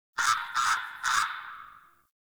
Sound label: Tree Critters Scared; Ambience; Props